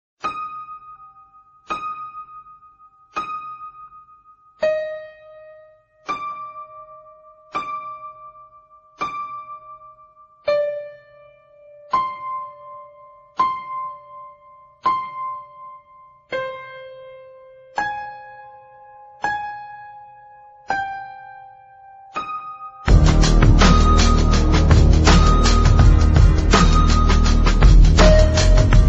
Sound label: piano